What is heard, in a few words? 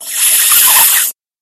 monster snake